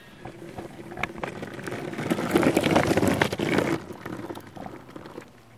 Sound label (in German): longboard